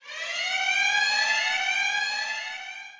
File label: warning light